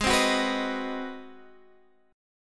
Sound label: Ab 7th Sharp 9th Guitar Standard, chord; Ab7#9 strummed; JGuitar